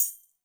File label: shaker, Zoom MRT-3B